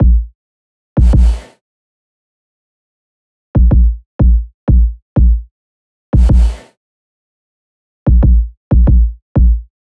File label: kick loop 93; Pop; Drums; Loops; Slate; SD PASADENA Pop Sample Pack